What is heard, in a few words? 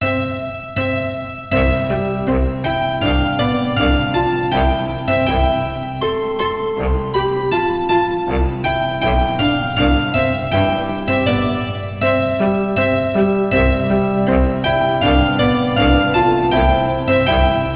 Eine Kleine Nachtmusik KV 525 II Romanze